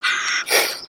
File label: Pet Dragon Happy; creatures; pets; Lego